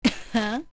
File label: comb wink; OOT